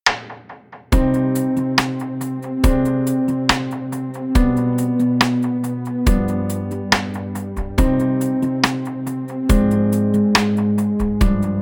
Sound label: sad urban